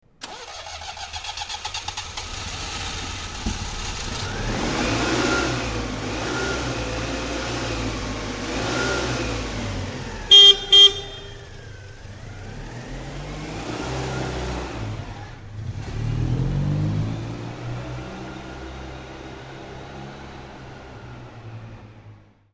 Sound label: Citroen 2cv car motor start and acceleration sound